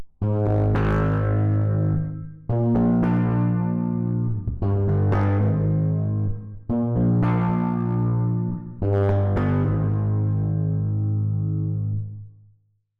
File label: guitar